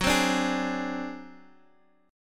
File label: G sharp 7th Flat 5th F sharp